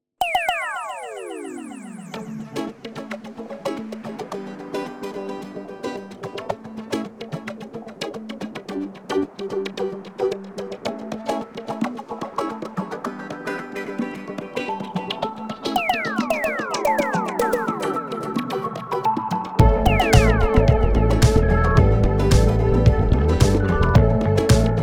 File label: Electro Pop